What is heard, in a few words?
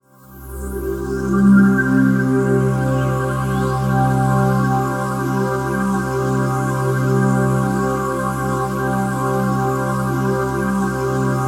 ATMOPAD; Best Service; XX Large; Pads